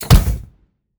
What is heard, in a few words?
footsteps proto mech air; robotic lifeforms 2; soundmorph